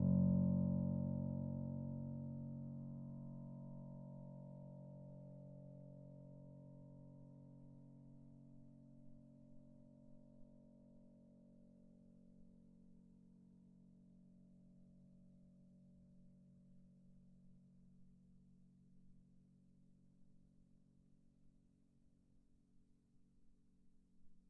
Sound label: F#1, bitKlavier Grand Binaural